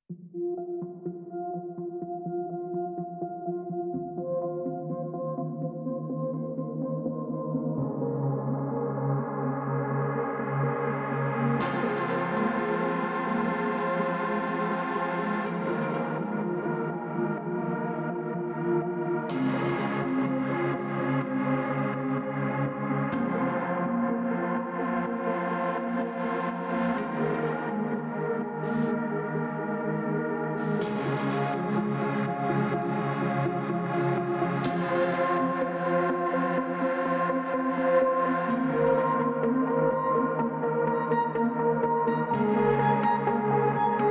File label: Ambient